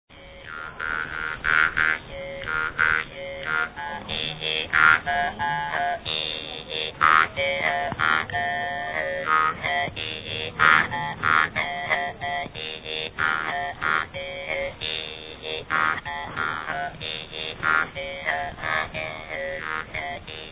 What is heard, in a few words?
Lao Instrumental Music; Phi Hmoung (Flute); Sam Neua/2000; Hmoung Lay Folksong: Khithot Phusao